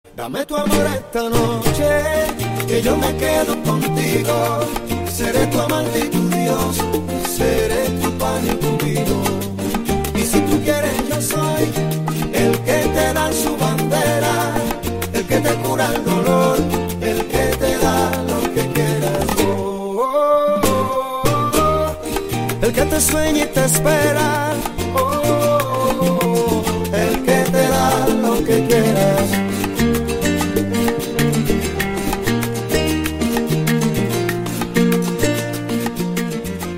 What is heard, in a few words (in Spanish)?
Salsa cubana